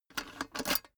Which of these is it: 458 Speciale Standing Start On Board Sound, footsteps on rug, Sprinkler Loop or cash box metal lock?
cash box metal lock